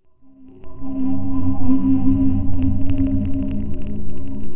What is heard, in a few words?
pad